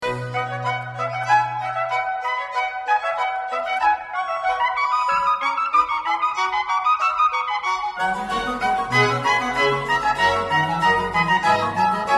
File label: Allegro assai low